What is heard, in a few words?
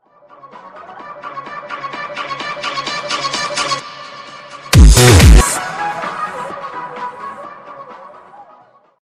Rave Sms